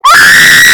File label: screaming in badgerian